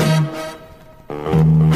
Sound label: sax one note bends, Loops; Phonky